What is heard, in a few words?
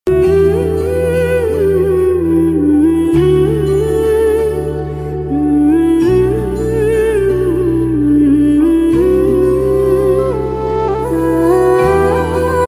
song female version instrumental ringtone